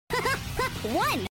haha

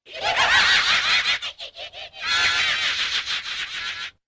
laugh; cave

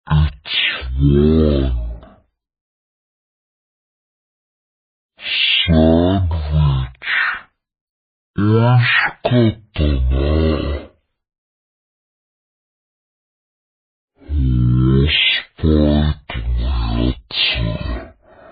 clock; vapesquared

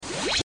blaster; reverse

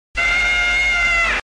scream

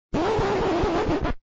scratch

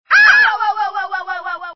toad; cave

